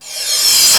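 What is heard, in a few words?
crash reverse, Dance Mega Drums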